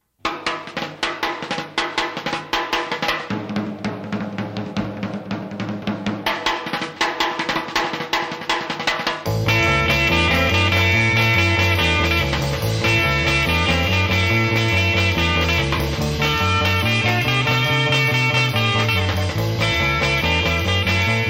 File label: bongo; brazilian